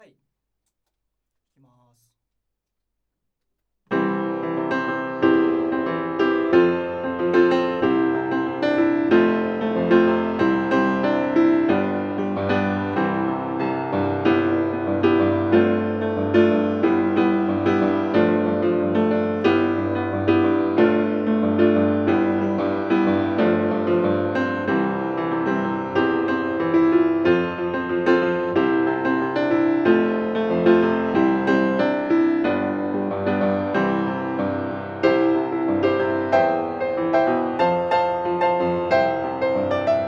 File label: Piano Outside